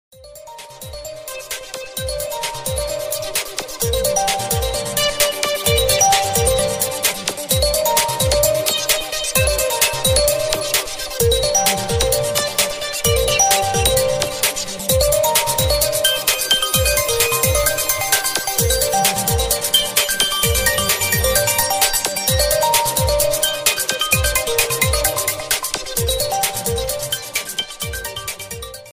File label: lenovo k8 plus ringtone